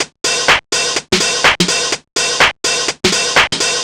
drum roger top 125, Drum Loops, Classic Chicago House